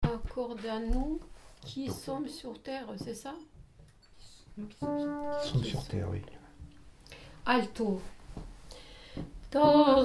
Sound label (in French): Tropaire pascal procession Alto